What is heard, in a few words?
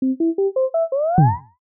logo retro arcade ready